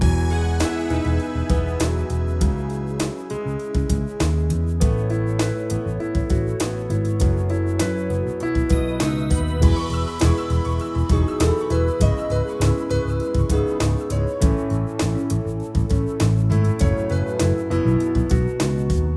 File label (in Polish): Melodia